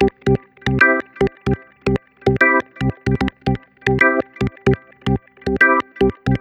Delayed Organ

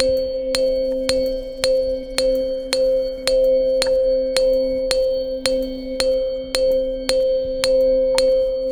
Clockwork

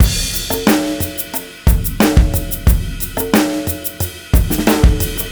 Delayed E; Сэмплы ударных (Легкая музыка); 90BPM; Drums; Easy Listening

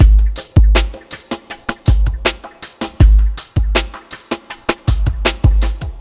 Polarbeat

